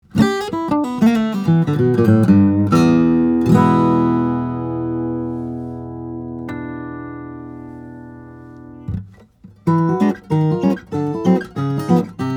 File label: Heinonen SJ, steel string guitars flattop; quilted sapele; cedar